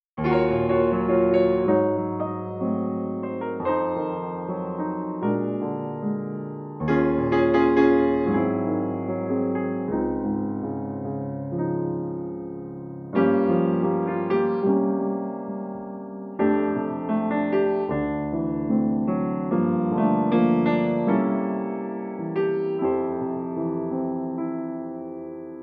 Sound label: Jazz Piano Soft Piano Music; Office Music